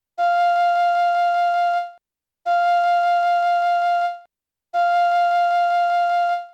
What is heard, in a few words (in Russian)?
1 oktava 4 fa flejta